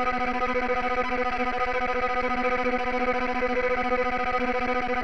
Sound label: Tremolo